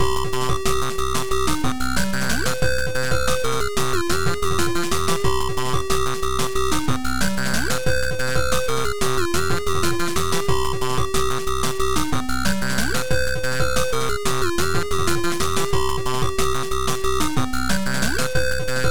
Music Trance

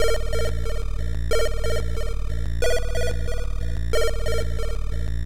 Space sounds

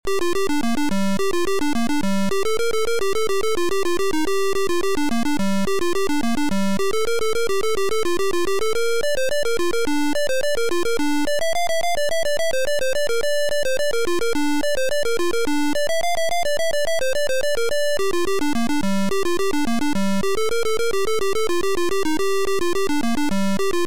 MELODY

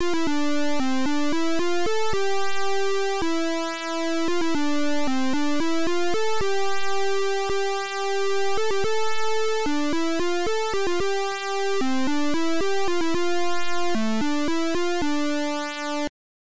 Title Beeper; Star Quake

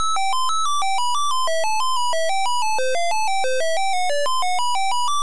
MELODY

